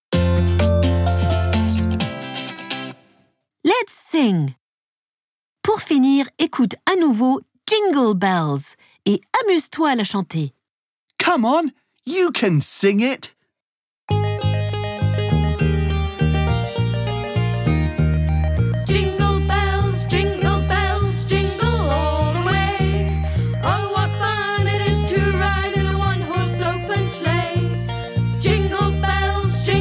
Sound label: Let's sing Jingle Bells; I Love English for Kids